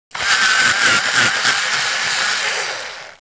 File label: pencil sharpen